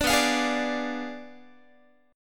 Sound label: B Diminished 7th B down Guitar; strummed; tuning 4A,4E,5A,5D,5G#,6C#